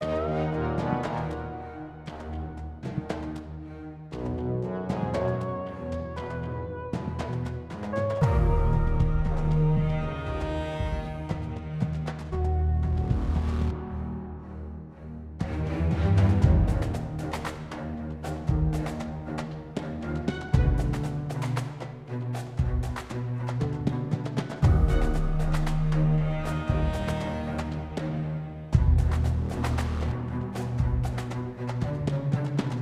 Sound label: Kaiju Neutral